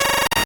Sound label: 8bit se